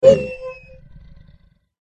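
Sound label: werewolf wound; oboroten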